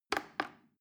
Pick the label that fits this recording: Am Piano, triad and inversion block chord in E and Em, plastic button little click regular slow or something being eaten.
plastic button little click regular slow